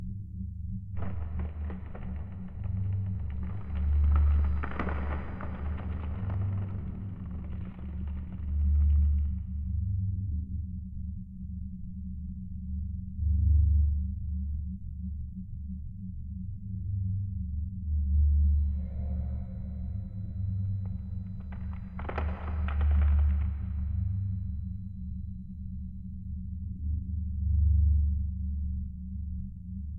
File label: ambiance barrel; Fate The Cursed King